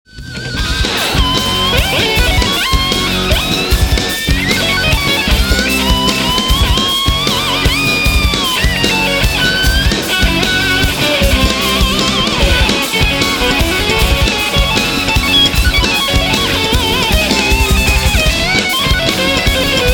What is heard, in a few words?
solo